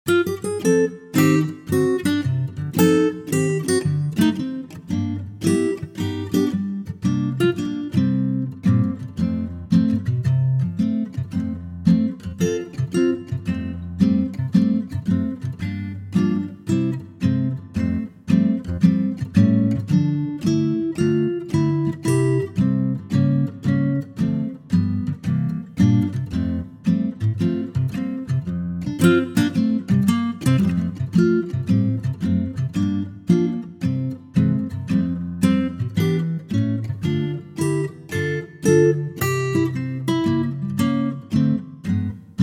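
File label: F acoustic guitar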